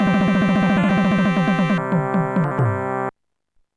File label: medieval short; Instant Music